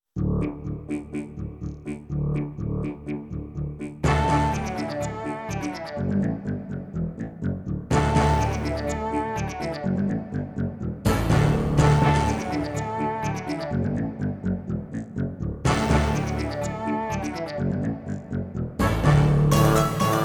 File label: Musette BWV 126; J.S.Bach; G.Kuprevicius